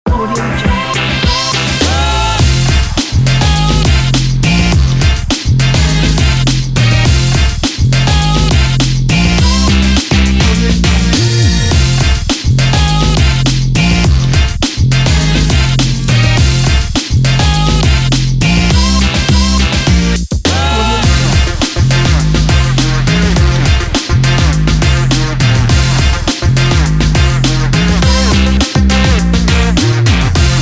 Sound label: upbeat hiphop; modern hiphop